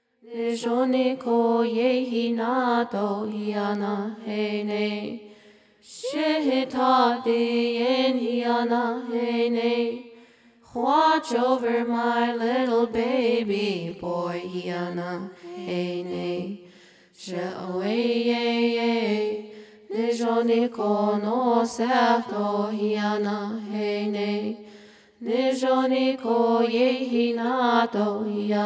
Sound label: Peyote Song